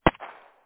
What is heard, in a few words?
Gunshot Single; AutoHand; EmergencyEvacuation